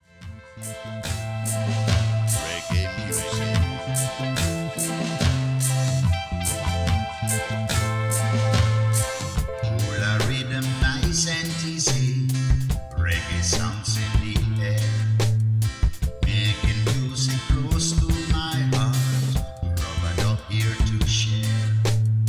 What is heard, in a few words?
Reggae music